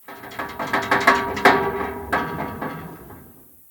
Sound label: Metal Bars Random; The Town